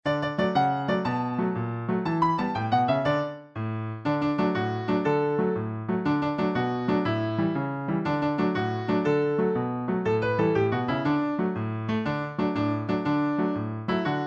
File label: looby loo instr; folk